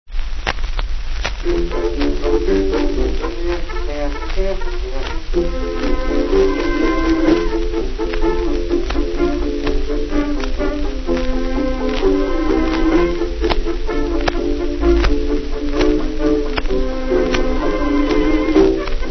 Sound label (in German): posaune